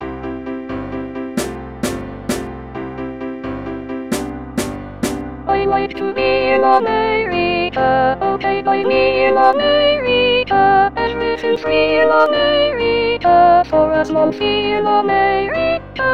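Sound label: America Soprano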